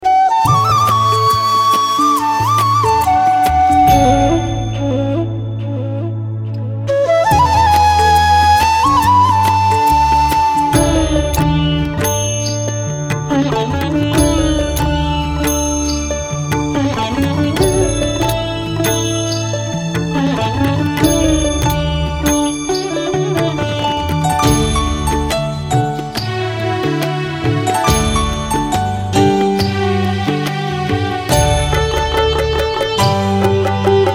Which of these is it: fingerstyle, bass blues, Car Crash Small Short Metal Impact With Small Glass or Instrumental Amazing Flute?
Instrumental Amazing Flute